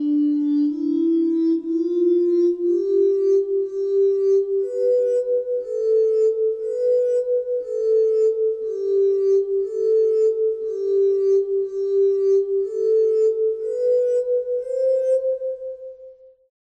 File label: Glass Harp; Drumwavy; Syntheway